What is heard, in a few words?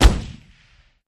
Gun Shot Single Silenced